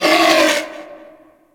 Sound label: Venus Landscape Cave Creature Calls